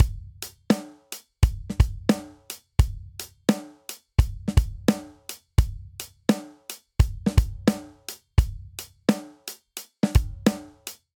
Inbetween Hip Hop Verse 86 bpm, drum; MIDI Clips; 4 4; Ableton; Drum Booth

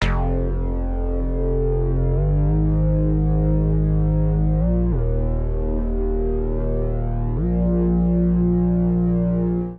anxious woofer bass F major 98 bpm; MIDI Clips; Bassline; Ableton; Factory Packs; Chop and Swing

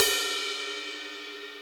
Ride Bell; Hybrid Kit; Drum Hits; Drumdrops Recording Hybrid Kit

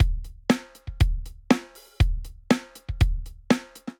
Piccolo Kit, Drum Kits; Ableton; Drum Booth

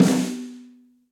Snare Press Ambient Loud Punch; Snare Articulation; Drum Hits; Session Drums Studio; Standard Hits

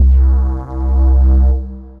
Bass Deep and Dark Pad Bass; Drive and Glow; Ableton; Factory Packs

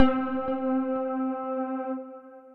pizza pluck, synth keys; Please Pizza Pluck; Ableton; Factory Packs; Chop and Swing

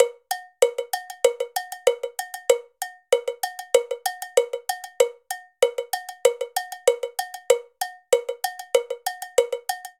Songo 1 B Cowbell 96bpm, Latin Percussion; Ableton; Factory Packs

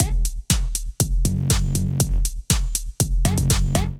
Diz Night Kit, Drums; Ableton; Build and Drop

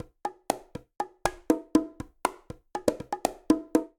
Bongo A; Latin Percussion; Instrument Kits; Ableton; Factory Packs

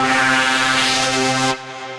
Diso Synth Chord, pad; Ableton; Factory Packs; Punch and Tilt

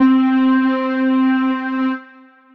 brass wave, synth pad; Ableton; Chop and Swing

